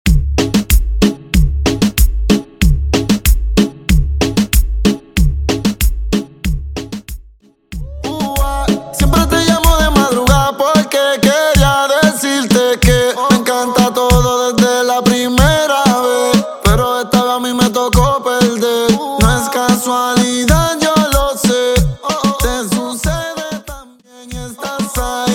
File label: Intro Outro Segway 94 BPM